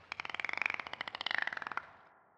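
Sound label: Dolphin (Ambient); Sprill: The Mystery of the Bermuda Triangle; Delphin 2; iOS, Windows gamerip 2008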